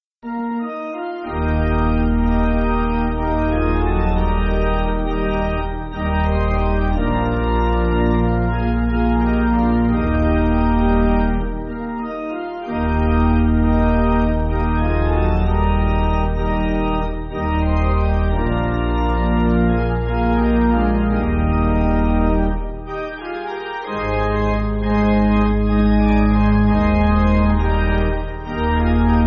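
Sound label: Pipe, Pipe Organ, Music Backing Files for Churches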